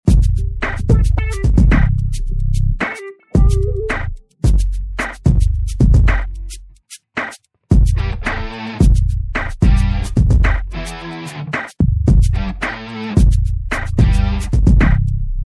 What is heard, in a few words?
Loop